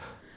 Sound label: techno